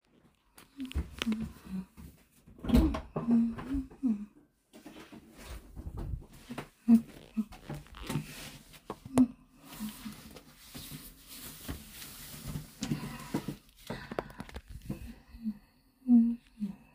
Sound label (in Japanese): 鼻唄まじりに！おトイレで！オシッコですッ！